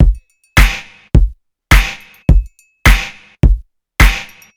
105 bpm breakbeat sample f key; Samplerate 44100 Hz

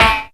clear snare a key; drum single hits one shots

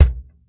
fat bass drum single shot a sharp key; Duration 0:01; Channels Mono

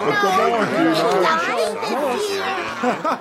INDISTINCT CHATTER

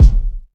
low tone kickdrum b key; kick drum samples

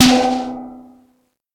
perfect snare drum b key; snare drum samples

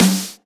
focused snare sound f sharp key

